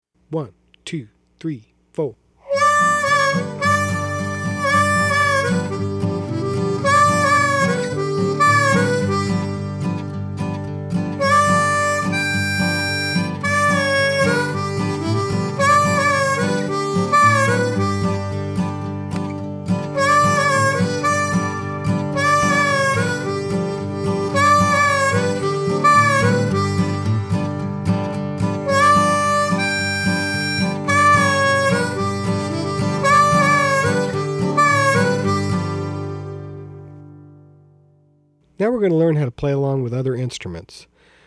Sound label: Reubens Train Fast